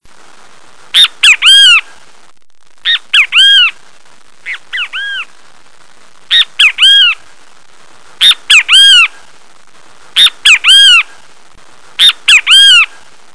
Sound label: kikiwi long